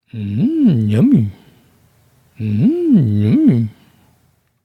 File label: eat fruits